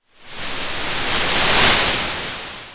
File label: Sound of waves crashing on a shore